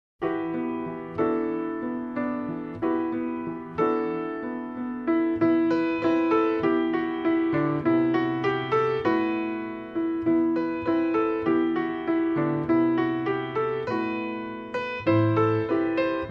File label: God Rest Ye Merry Gentlemen, Solo Piano